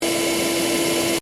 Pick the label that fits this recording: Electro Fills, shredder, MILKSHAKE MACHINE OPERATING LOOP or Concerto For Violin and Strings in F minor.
MILKSHAKE MACHINE OPERATING LOOP